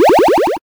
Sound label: spawn; Season Land